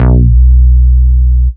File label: Root B, Ultimate Bass; Vengeance Samples